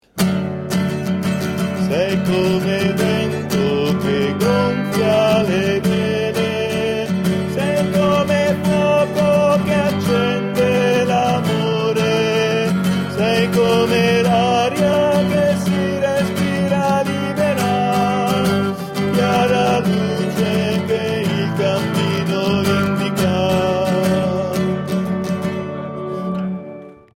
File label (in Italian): Tenori